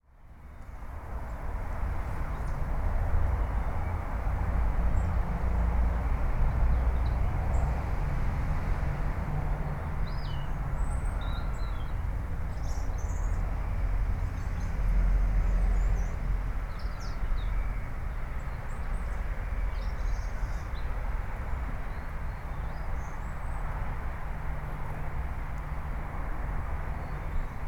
Residential Area Near Park Busy Road And Playground In Distance